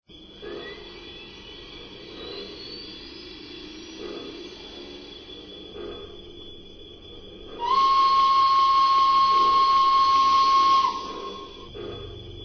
Mikado

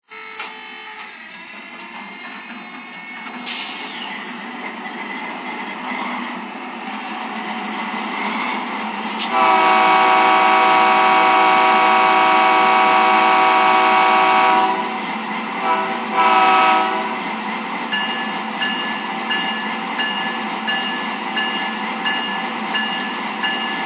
dash8

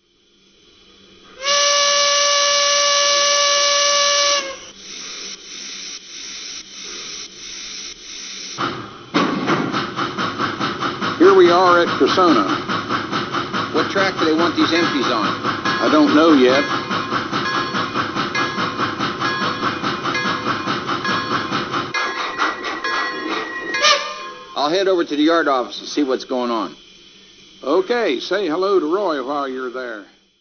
T1, trains

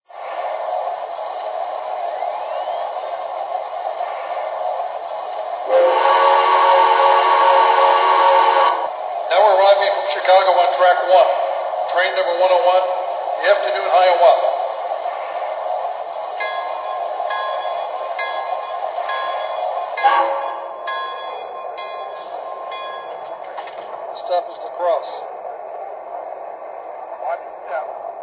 hiawatha; mlw

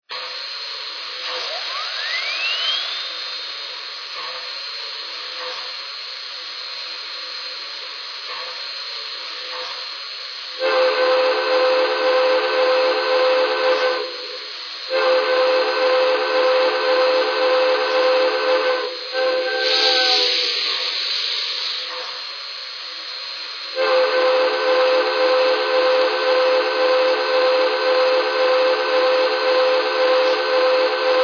hudson

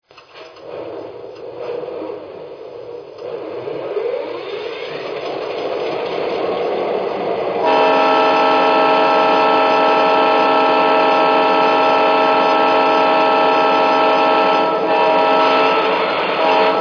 GP38 2, trains

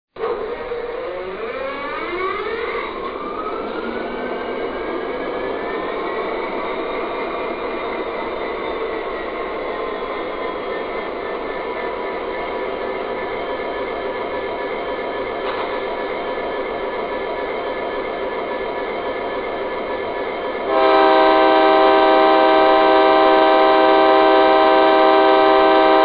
sd60, trains

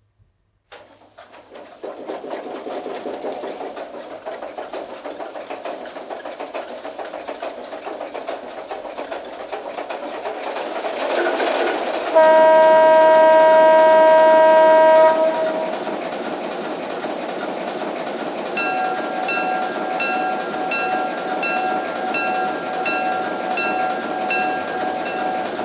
RS-1, trains

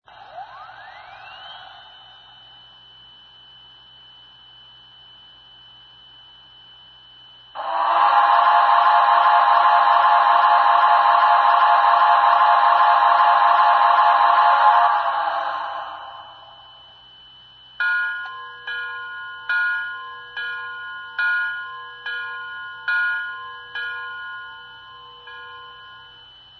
SHAY

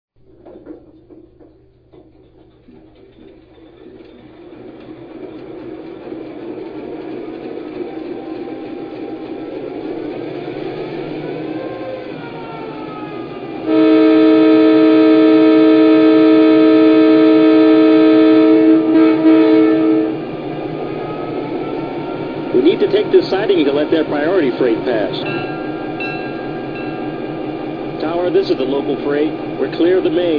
EMD GP9

